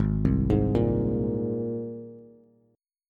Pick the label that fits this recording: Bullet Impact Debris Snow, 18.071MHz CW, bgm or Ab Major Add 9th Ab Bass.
Ab Major Add 9th Ab Bass